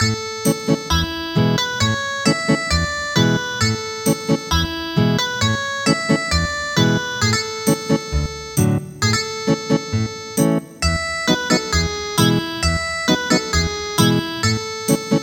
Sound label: Ethnic Guitar